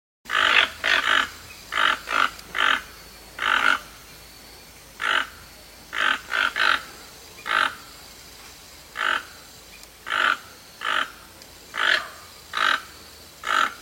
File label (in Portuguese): canto do tucano toco